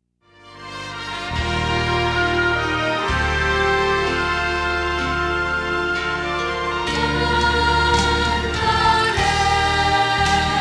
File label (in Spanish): Contralto